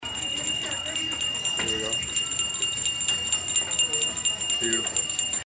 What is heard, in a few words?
Bell Ringing Sound